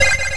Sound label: enemy bullet; mazeofgalious; jorito